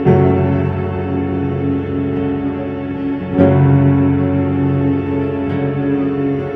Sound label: piano, Loops Sad